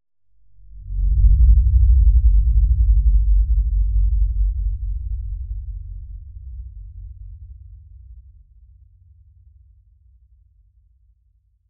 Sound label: Low End Rumble